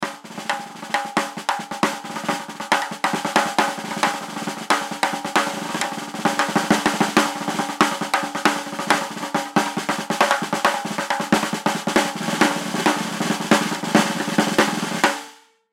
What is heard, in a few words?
Drum Snare; Snare 14 Inch Pacific Drum Solo; Drum Kit Sound Effects